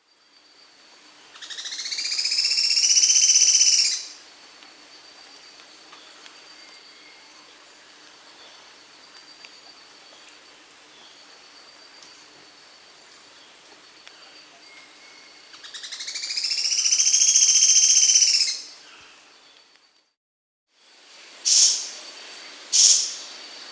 Sharp-Tailed Streamcreeper (Lochmias Nematura), Andean Birds; S. America